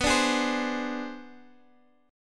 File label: B Minor 6th Flat 9th B